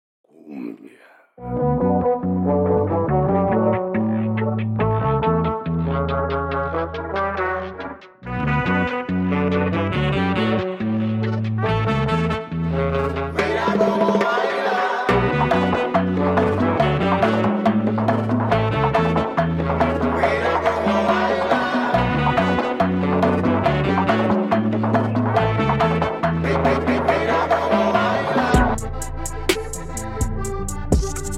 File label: cumbia